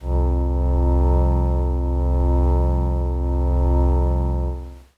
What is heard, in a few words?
Glass Howl Kawaii F1; pads